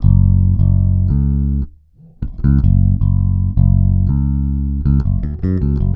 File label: bass deep, Loops